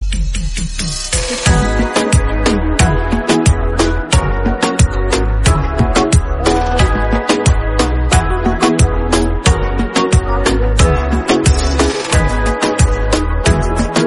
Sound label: Instrumental